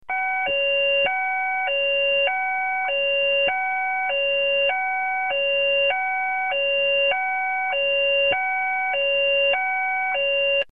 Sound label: Muster Tone